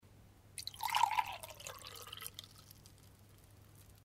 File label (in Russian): nalili vodu v stakan